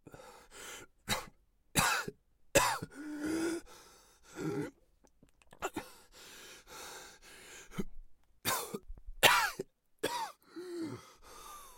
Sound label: bear low hp; eft